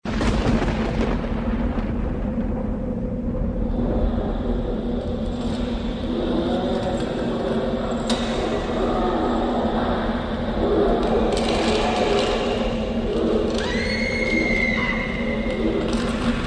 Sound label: ambience, zombie